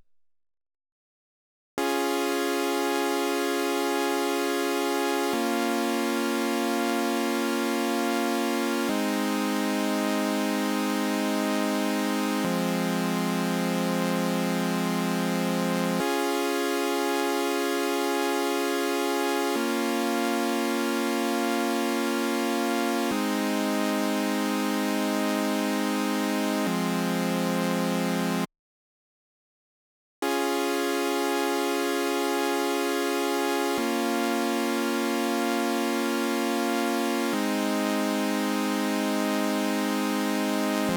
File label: chords